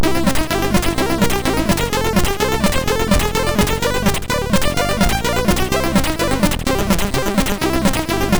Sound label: sid MOS6581R2; Party Time